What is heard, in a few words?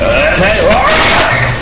hurt